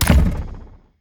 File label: imp cello